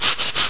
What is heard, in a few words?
SCRUB